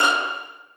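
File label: 2nd violins pizzicato e6; Sonatina Symphonic Orchestra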